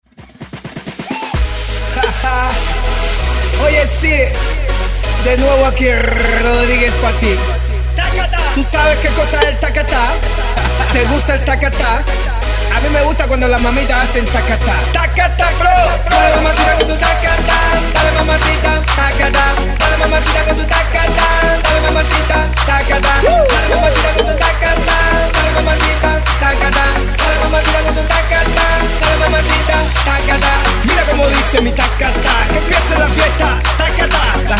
Afrobeat Redrum; remixplanet

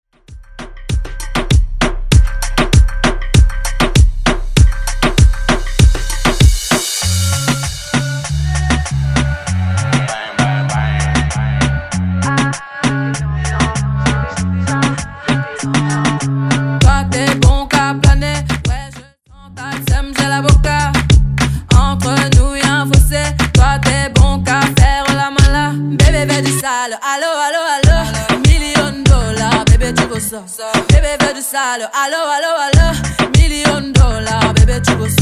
Reggaeton Redrum

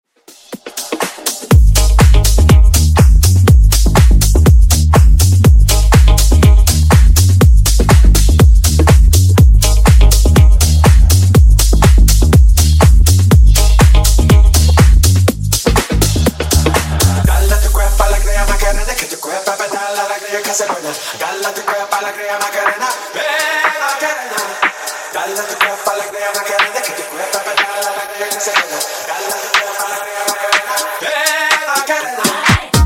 Funk Remix 124 Bpm

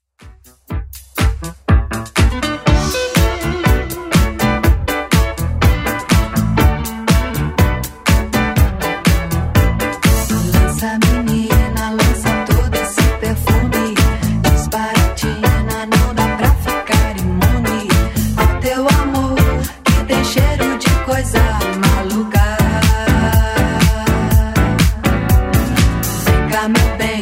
80s Club Edit 122bpm

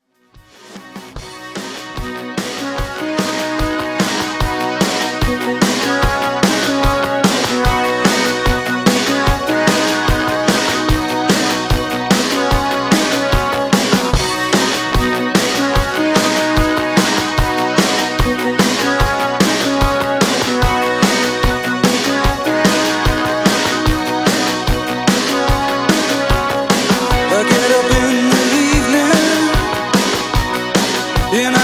80s Rock ReDrum, 148 Bpm

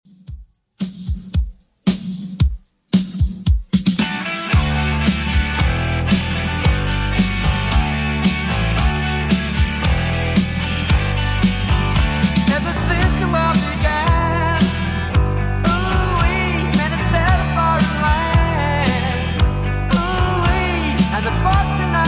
80s Redrum 113 bpm

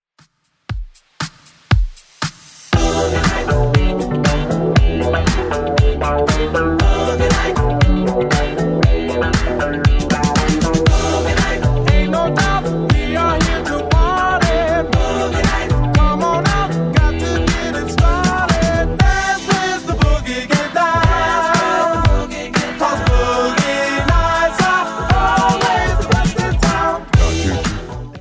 60s Dance, 118bpm; Redrum

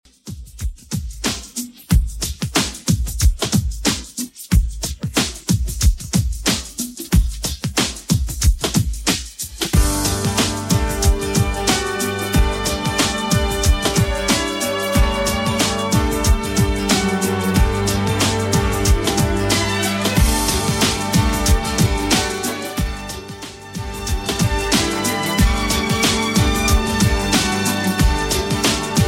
90s Redrum, 92bpm

